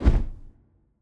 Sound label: Hawk Flap